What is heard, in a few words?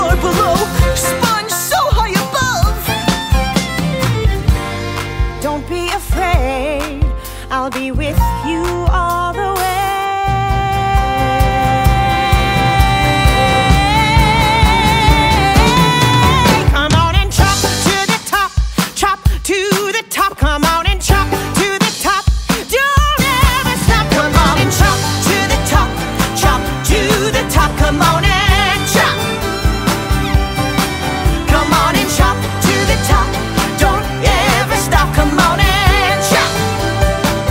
with Vocals